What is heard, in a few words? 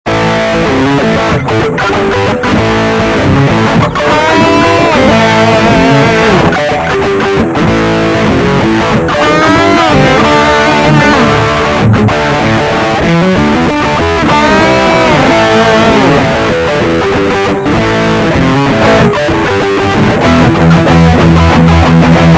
12 bar improv